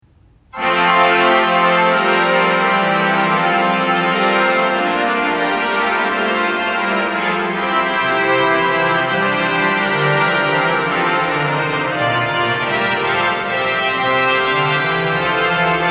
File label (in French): grand jeu